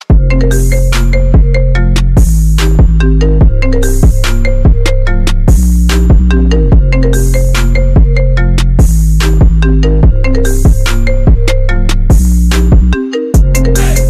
Iphone Song Remix

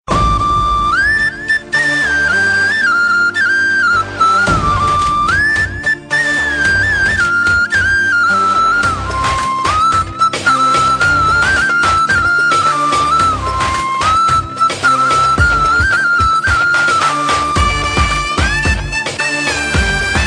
Melody

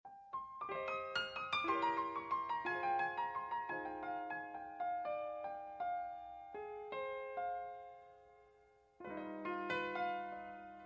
Music

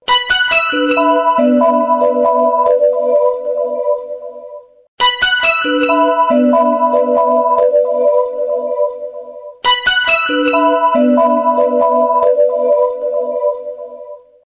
Alarm New Nokia

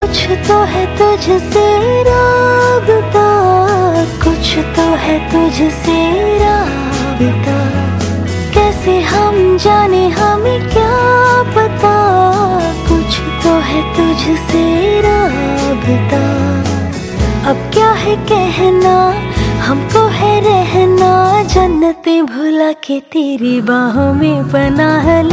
female Voice

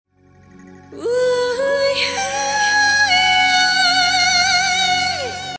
Awesome Sms Tone

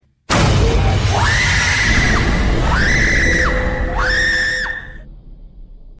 Scary Sms

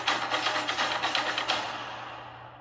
drums; Jumanji

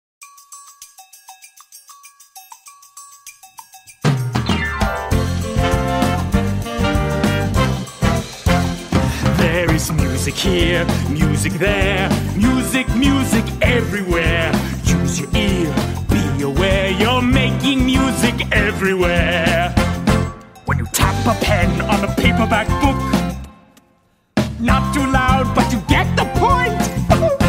Music Everywhere

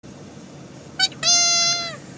Cockatoo

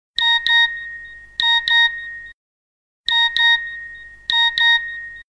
NOKIA SMS

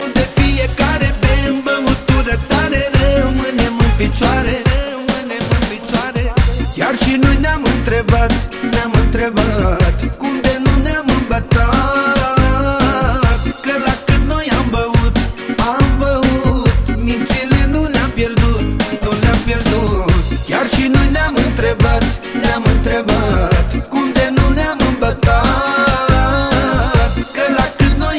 Manele

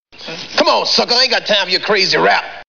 Crazy Rap

